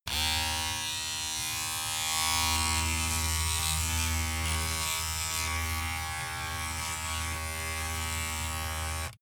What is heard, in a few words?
razor on load pov short